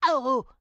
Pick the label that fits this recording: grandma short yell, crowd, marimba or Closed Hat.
grandma short yell